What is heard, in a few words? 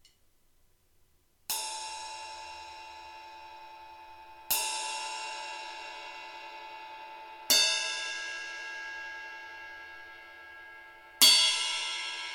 Ride Ping 20; Amedia; Classic